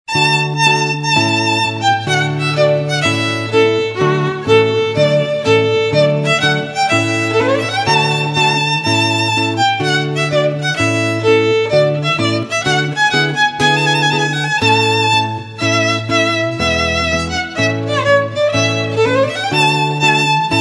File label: Trumpet Tune Violin Guitar